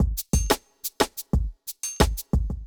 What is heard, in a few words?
bell ringer 90